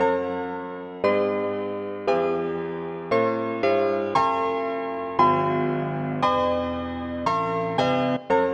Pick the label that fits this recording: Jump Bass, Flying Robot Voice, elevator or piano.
piano